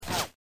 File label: bullet by